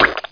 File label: EGG SPLAT; Education Publishing Concepts; NOAHDEMO